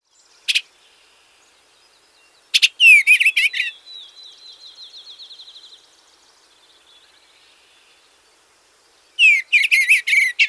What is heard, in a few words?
eastern bluebird